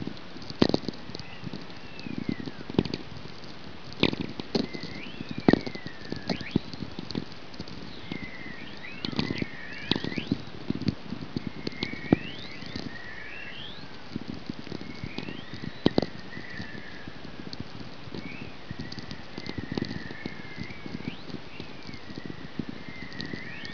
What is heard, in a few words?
VLF aurore whistlers pure, Alaska